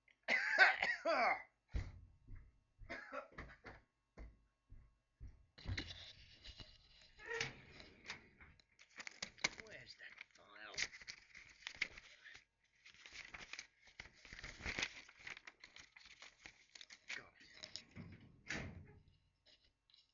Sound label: Filing Cabinet